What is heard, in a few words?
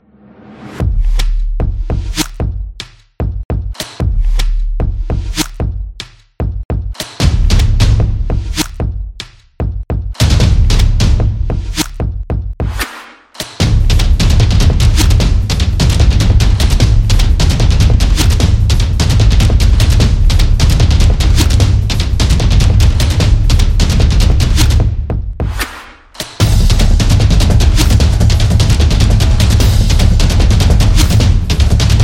Fast Pace